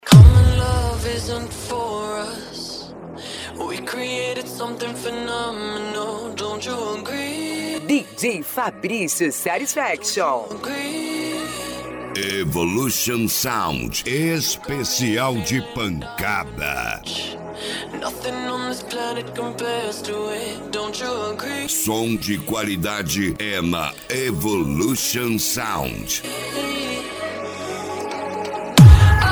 esp de pancada

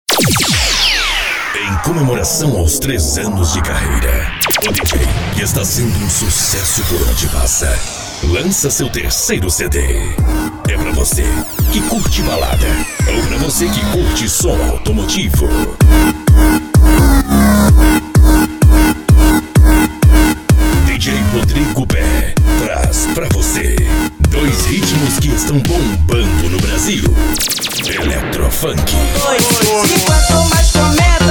eletro funk e funk bass

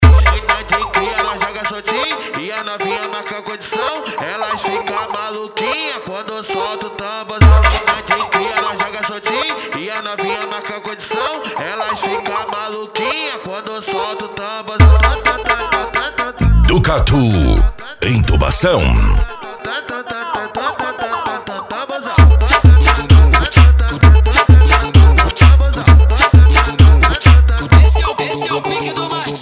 funk agressivo

